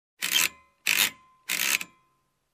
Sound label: Screwdriver